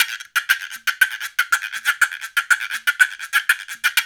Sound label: Gua Guanco; Spectrasonics - Supreme Beats - World Dance